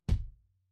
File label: impact thud